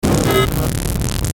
Glitch Noise Static Blurb, Glitch Static Stutter Warble; Glitch Sound Effects; Production Element Imaging Element Accent Transition